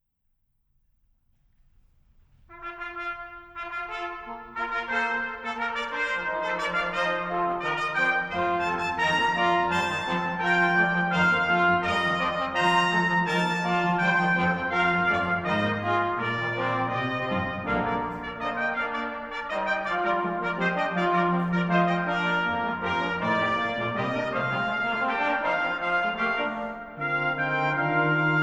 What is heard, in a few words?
Fanfare